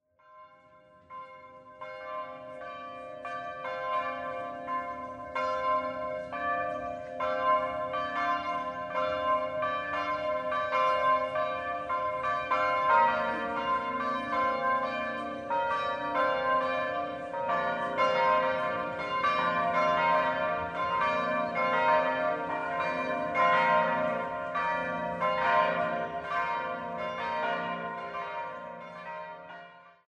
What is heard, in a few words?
Bells of Taize